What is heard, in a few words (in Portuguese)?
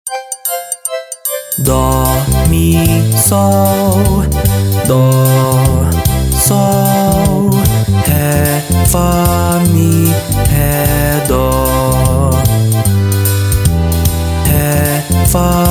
forro